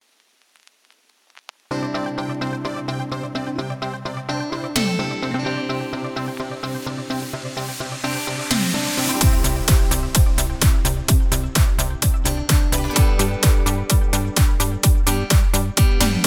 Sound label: karaoke